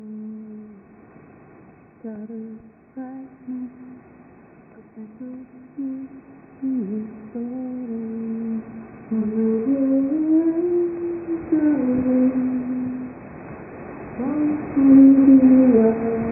9450 kHz

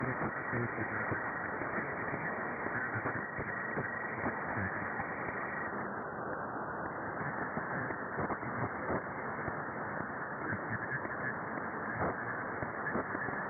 9,45MHz

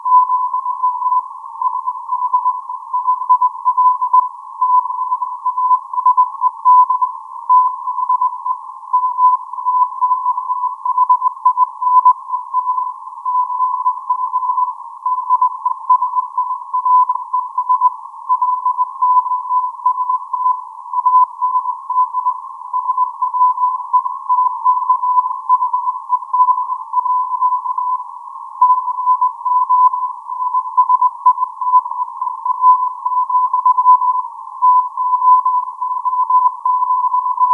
HAARP 9100 kHz; DL1DXX

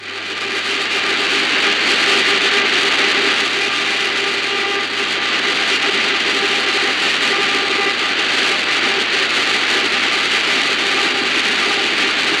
HAARP 3.30 MHz, Anchorage Alaska; Ghosts in the Air Glow; Kiwi sdr WL7NO

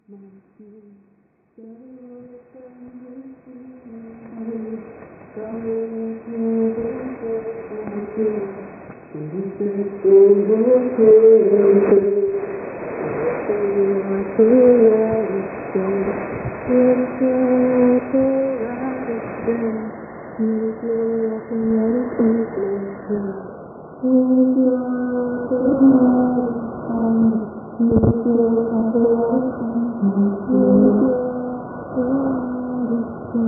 9450 kHz

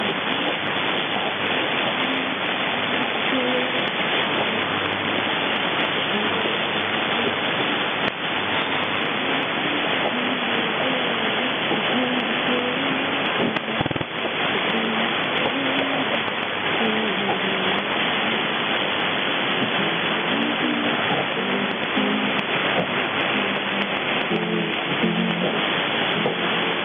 9453 kHz AF 9450 kHz; HDSDR; GITAG No2 Episode XVI

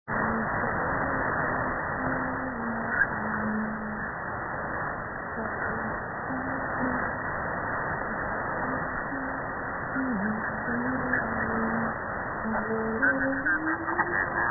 HAARP 9450; Khabarovsk

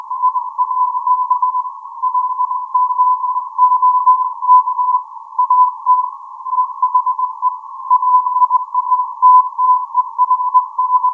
HAARP 9100 kHz; DL1DXX

